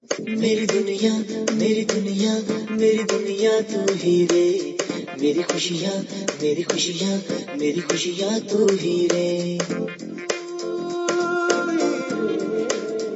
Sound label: Bollywood Ringtone